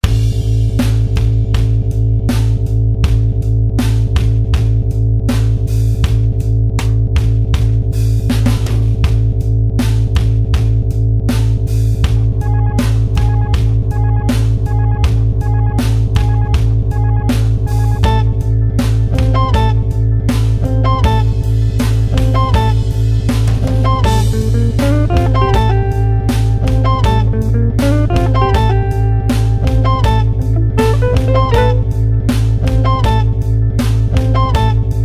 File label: Jazz Guitar; Ueberschall Jazz Guitar